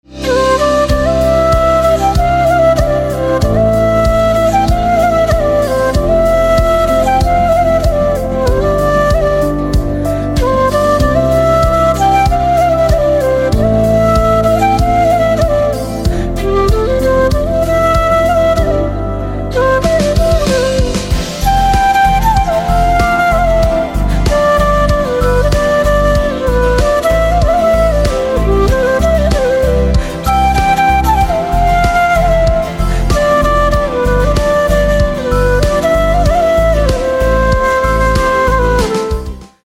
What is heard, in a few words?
Flute